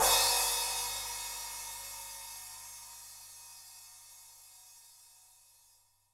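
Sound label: 18 Crash Rivet Br up6; Digital Sound Factory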